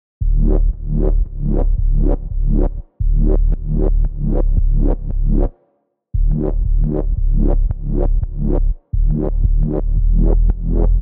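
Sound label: 172 C minor detuned saw swup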